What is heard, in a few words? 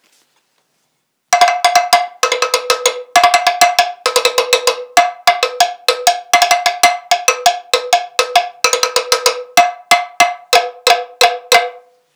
Krin, Schlitztrommeln; Djembe-Art